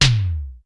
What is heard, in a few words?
SPACE TOM L, RY30 KIT; Crypton - DrumBase5000